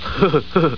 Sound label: laugh